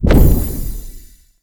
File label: Casting Device Impact Damage; Weapon Impacts; Player; Casting Devices; Galene Casting Device